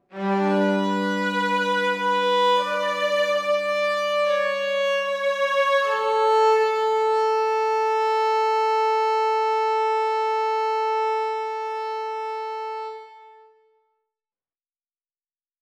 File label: Violin; Verdigris Music